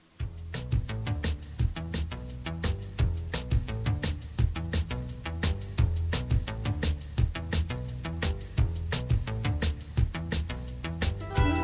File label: Piece for Solo Flute & Dolphi